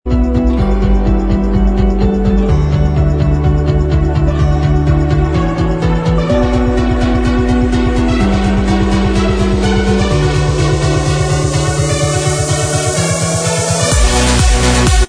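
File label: remix, instrumental, track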